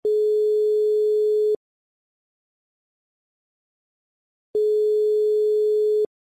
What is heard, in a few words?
Cyprus ringback tone